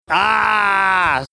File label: aaaaahh